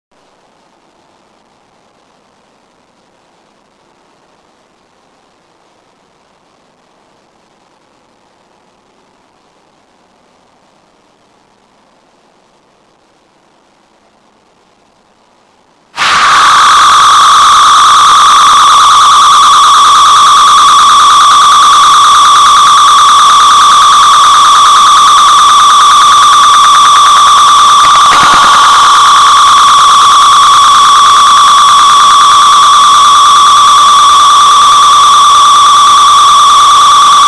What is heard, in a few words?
Morning Noise Broadcast